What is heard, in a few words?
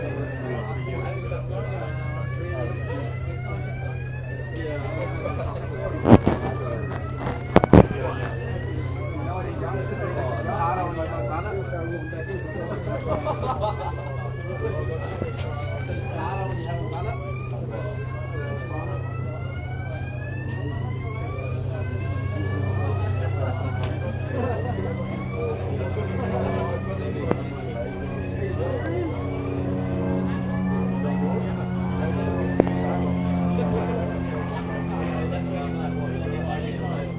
fire alarm drill; unisa